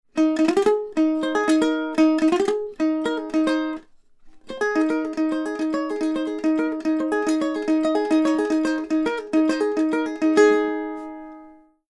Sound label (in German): Ukulele Niere; Lewitt